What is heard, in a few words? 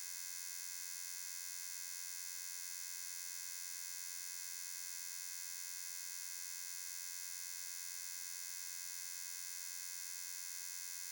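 fluorescent lightbulbs; blindside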